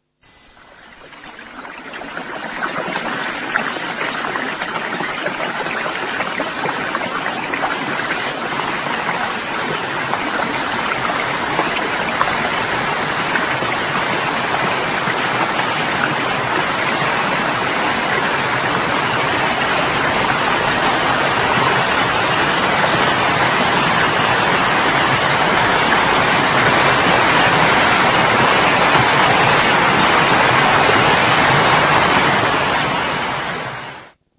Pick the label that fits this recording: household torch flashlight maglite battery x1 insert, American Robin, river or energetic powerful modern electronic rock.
river